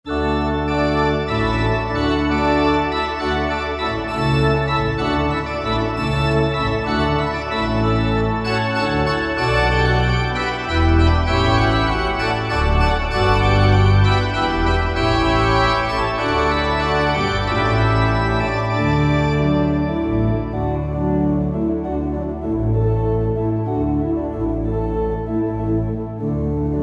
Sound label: Alla Hornpipe, Water Music, Pianoteq and Organteq